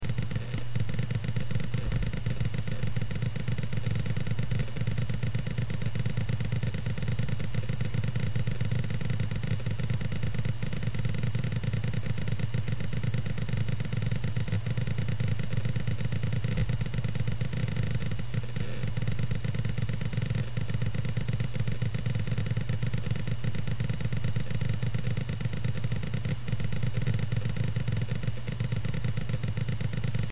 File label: seagate big, hd; hardware